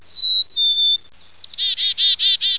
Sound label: Birdy